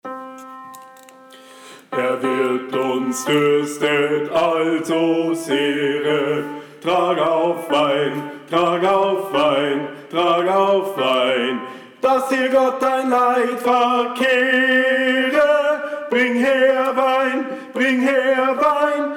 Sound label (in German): Herr Wirt STIMME 3 rechts; Gesang